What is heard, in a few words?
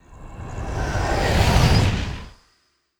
Dark Wind Growls; Legend of Keepers